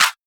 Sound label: clap crush, Hip-Hop; Drum One Shots; SD CAICOS Hip-Hop Sample Pack; Slate